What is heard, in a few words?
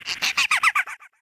gekko laugh